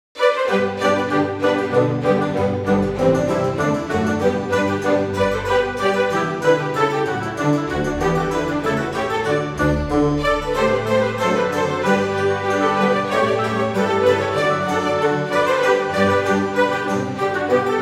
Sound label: Baroque Coffee House